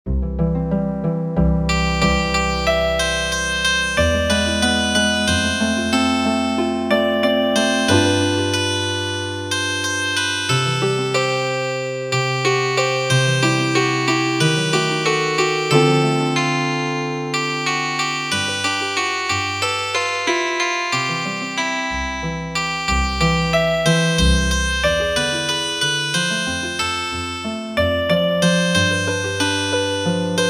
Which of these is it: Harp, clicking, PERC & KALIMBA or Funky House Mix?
Harp